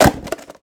drop multiple boxes; sonniss gdc

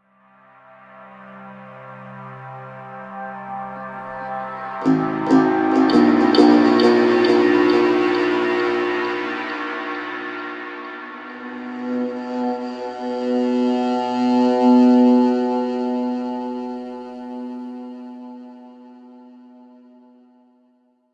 middle eastern darkness sorrow; sonniss gdc

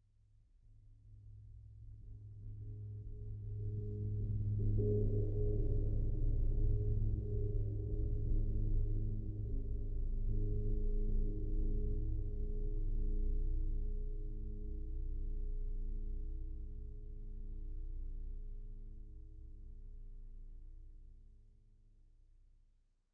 lfe pulsing ring out; sonniss gdc

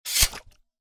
meleeattack impacts slashing; psfx

